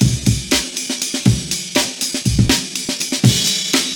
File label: amen break; OM-SoX hoaencode